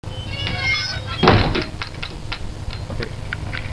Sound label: Screen Door Closing